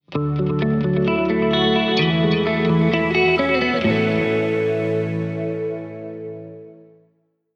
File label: ambient guitar magic